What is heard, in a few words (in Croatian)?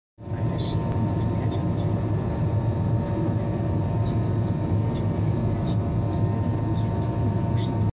U zrakoplovu